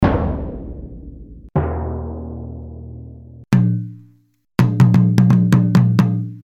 drum deep mix

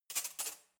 cutlery knife drawer place soft

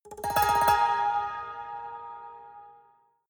haunted strings roll high

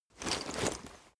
leather metal walk slow single

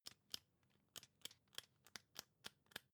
magazine browse

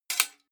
sword sabre metal block

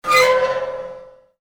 metallic scream

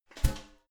garbage can close lid damped

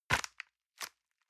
envelope grab release hard

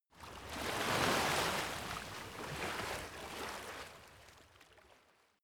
lake oneshot surf

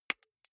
marker open lid

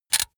razor retract comb hard

